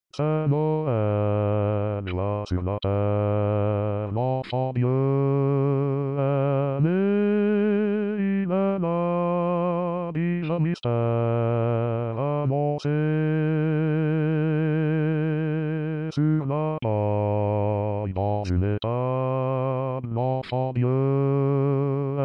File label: AMAZING GRACE, Basse